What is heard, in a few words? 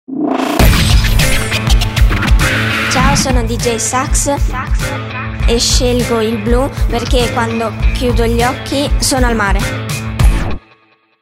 SAX COLORE